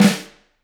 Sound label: room Flam, drum